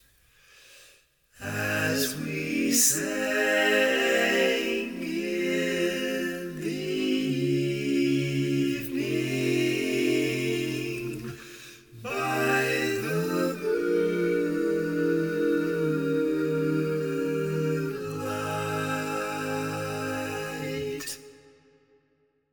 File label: All Parts, barbershop tags